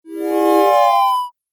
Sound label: 8 Bit Style Magic Portal; Video Game